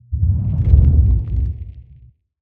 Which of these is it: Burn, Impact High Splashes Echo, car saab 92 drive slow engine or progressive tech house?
Burn